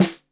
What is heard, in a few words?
Snare 65 Reg Stereo, Deeply Sampled Drums